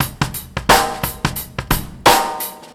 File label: 86bpm, Hip-Hop Street Beats; Paris Hall; Sonic Foundry; Hip-Hop Street Beats Construction Kit; Street Beats by Poogie Bell